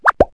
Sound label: whiff pop; Wired for Sound Pro CD; Aristosoft, Inc.; pops